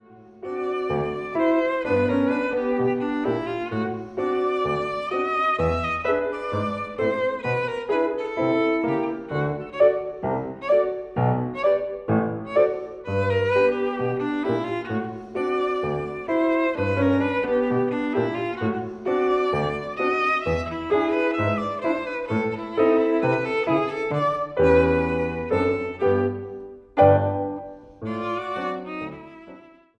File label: VIOLIN